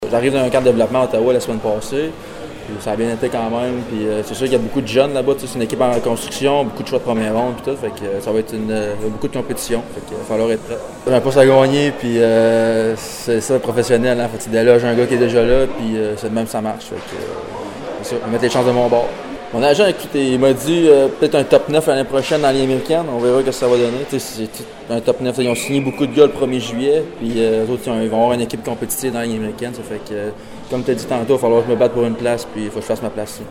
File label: CLAP